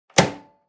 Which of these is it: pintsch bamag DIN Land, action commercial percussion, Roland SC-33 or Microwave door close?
Microwave door close